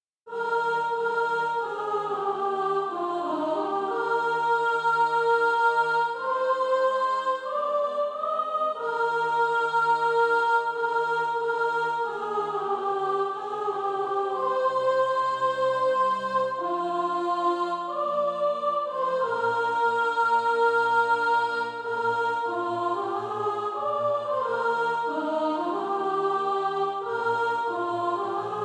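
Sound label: sop, Practise Music; Choose Ye Today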